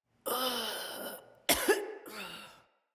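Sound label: Cough Walk Female; Battle Barks; Envoy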